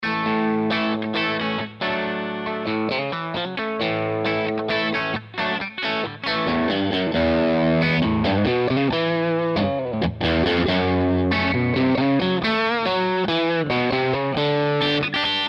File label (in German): Blues Junior, Huber Mod, Telecaster Bridge PU, Fat, nach 7sec Boost